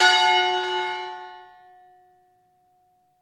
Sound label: manipulation semitone